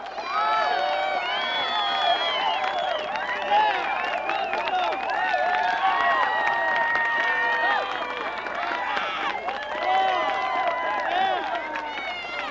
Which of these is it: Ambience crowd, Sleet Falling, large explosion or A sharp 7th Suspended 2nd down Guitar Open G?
Ambience crowd